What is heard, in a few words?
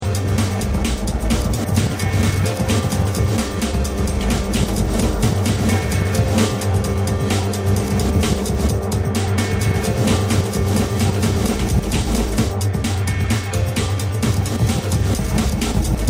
Hammer Dulcimer